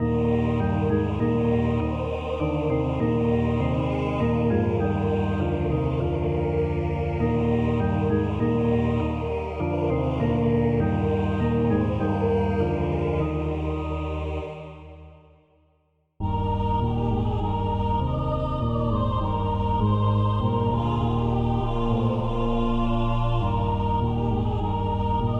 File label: polyinstru; hymns; Himnario grande